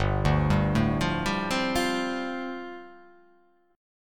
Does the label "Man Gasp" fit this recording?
No